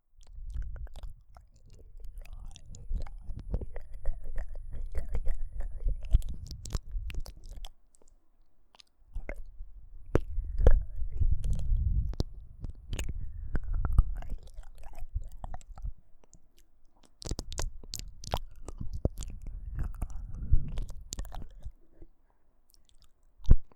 耳舐め, ASMR

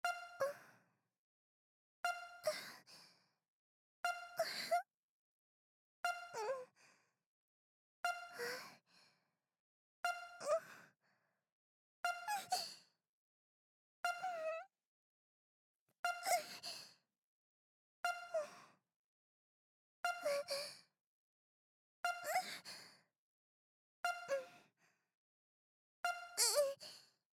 ゆっくり

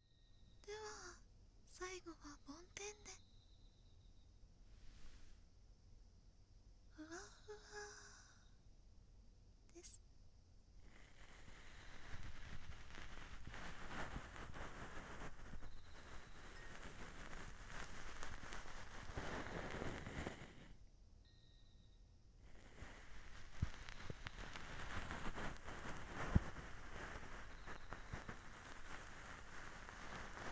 お隣さん夏 左梵天

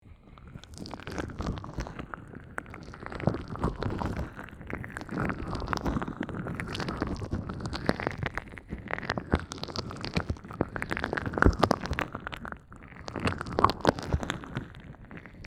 耳マッサージ, ASMR

